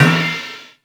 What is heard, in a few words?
CRASH; BEAT2000